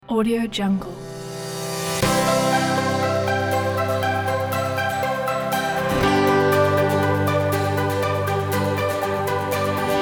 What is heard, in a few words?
uplifting happy